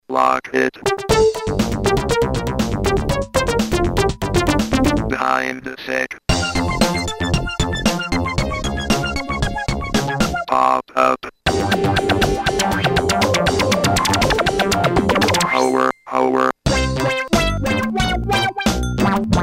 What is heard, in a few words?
Synth 4op